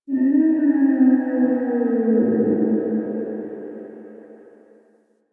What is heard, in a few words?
Drifter Camp Distant Creature, Creatures, Ambience; One Offs; Warframe; Quests; The New War